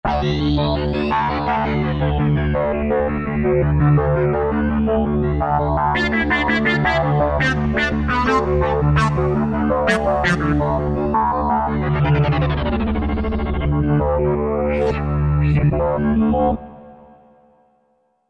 Piston Pulse, Roland V-Synth; Factory Patches

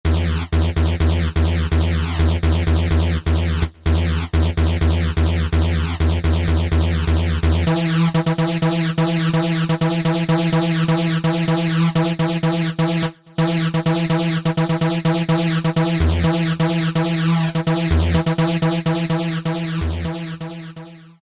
PULSENOISE; Best Service Alpha Dance II

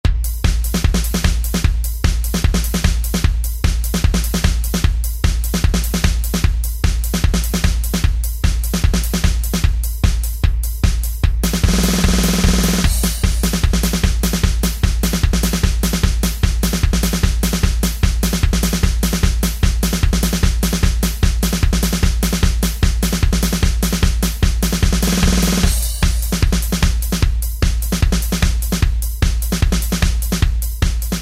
Alesis SR-16

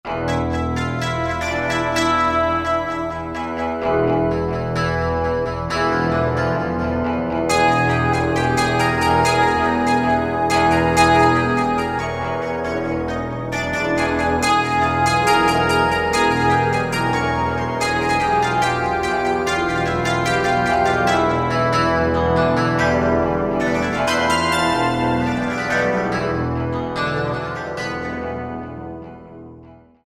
Ethnic Ensemble; Roland SC-880; Factory Patches

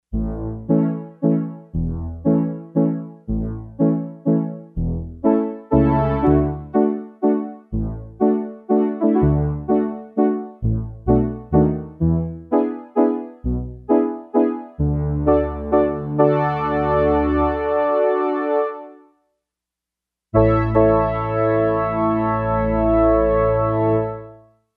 Ensemble, Yamaha TX802; Performances; Factory Patches

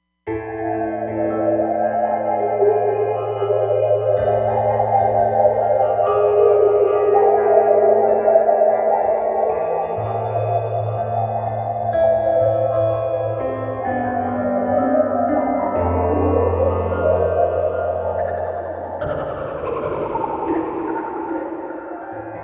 Faked Piano, Roland Fantom XR

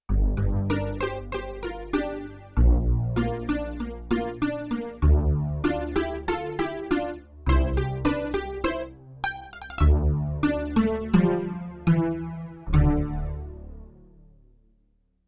Pizzicato-dual, Spacey Keys, Yamaha TX16W; Spacey Keys 2

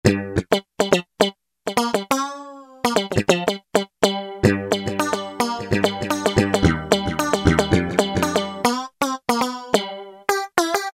Double EG, Roland U-110; Factory Patch

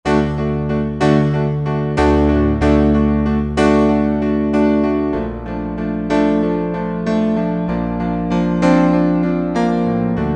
RD A.Piano, Roland SR-JV80-03 Piano

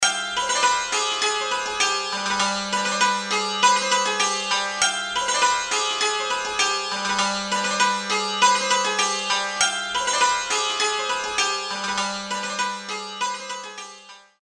Eastern Santoor; Apple GarageBand 2; Apple Loops

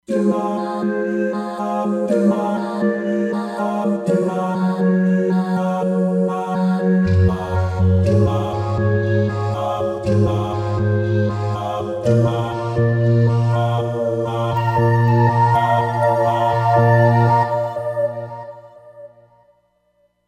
Beat Vox, Roland Fantom XR

